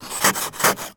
transportation ice scrape from car window external